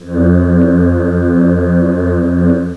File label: voices f2